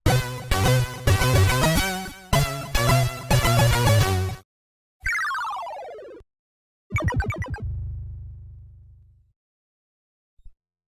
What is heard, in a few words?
Game Start ~ Miss ~ Clear; Mario Bros.; ocean; commodore 64